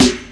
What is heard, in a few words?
SD, Dance Mega Drums; Best Service; SD-HIP